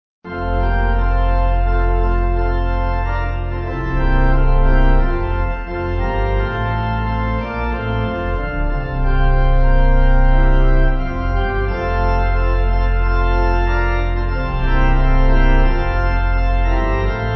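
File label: Pipe Organ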